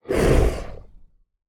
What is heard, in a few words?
Voboola Roar